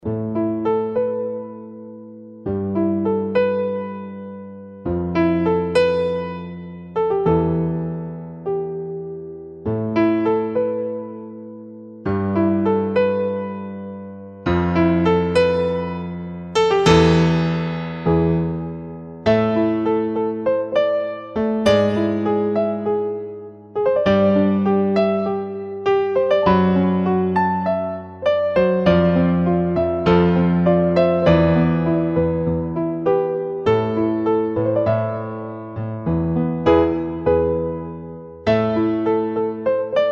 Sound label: piano; MSX